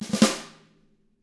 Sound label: ruff, snare; ILIO; Double Platinum Drums 1; SNARE ROLLS